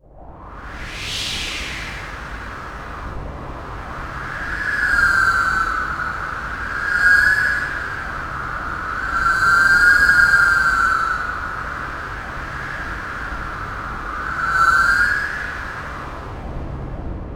tech fx; Best Service; Hyper Dance; EFFECTS

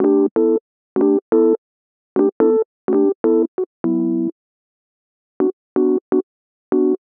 125 Rhodes Dom E, Keys, Nu Jazz House

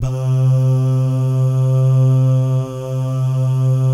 BAH GUY, BAHS GUYS

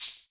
Shaker; Equipped Music; Loungin House

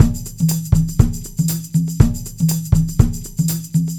Combo, R&B Drums

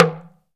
TALK DRM, Heart of Africa; Spectrasonics

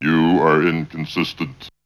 Vocal; ZERO G - Datafile DISC 2

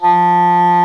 DOUBLE REEDS; OMI - Universe of Sounds

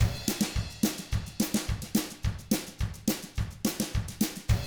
TRAIN, Double Platinum Drums; ILIO; Double Platinum Drums 1

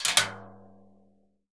CASCARA SH, Synclavier Percussion; ILIO; Synclavier Percussion 2; World & Orchestral

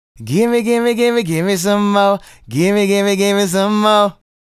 Male Solo, Vocal

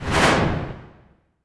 cinematic impact wipe

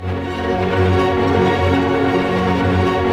STR ARP MAJ, Symphony Orchestra, STR Arpeggio; Roland LCDP08 Symphony Orchestra; STR Arpeggios

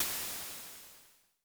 Crash Waves, Vermona Mono15 Drums

